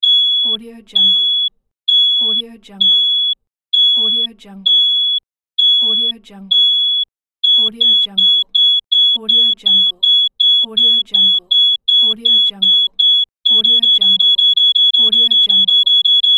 car parking sensor; dragospitaru

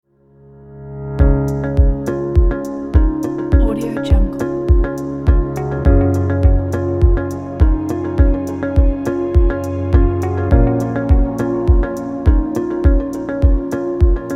ambient music

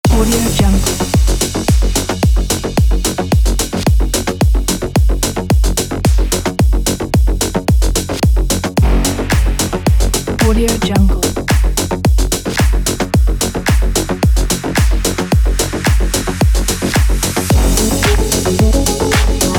techno industry kit

